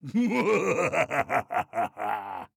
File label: bwahahaha; murray